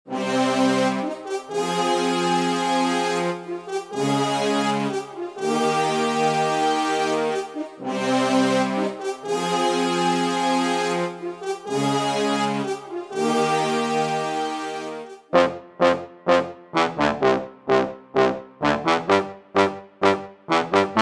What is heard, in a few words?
raw southern horns